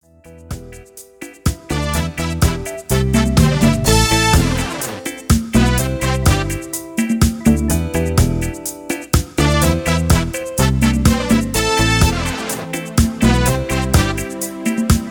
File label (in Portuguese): reggae amostra